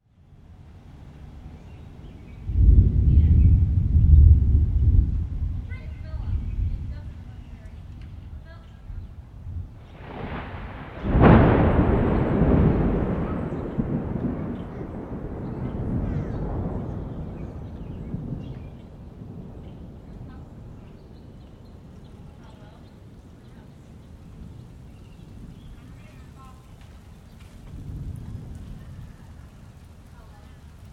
Weather Thunderstorm Rain Approach Neighborhood Ambience; Thunder Sound Effects; SFX Bible 6